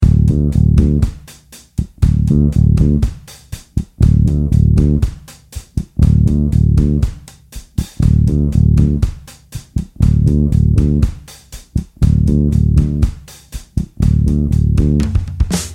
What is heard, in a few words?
Octaves drums